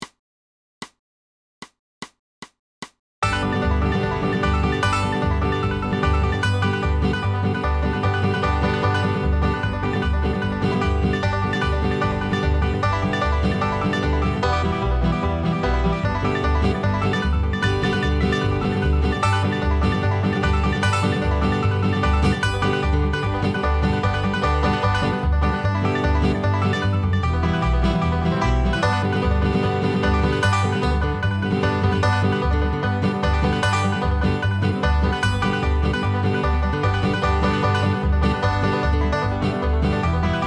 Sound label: 150 BPM, Banjo